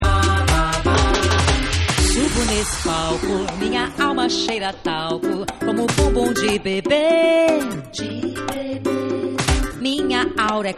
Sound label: Brazilian Groove Vocal